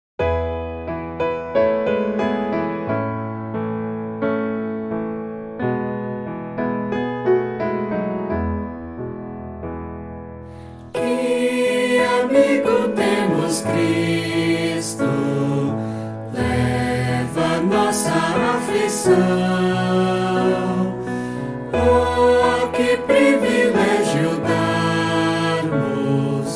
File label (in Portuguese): voz piano